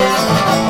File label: dobro danger